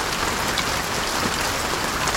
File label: rain; 摇钱树; ACTIONS